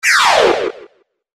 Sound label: FALLING; pictcode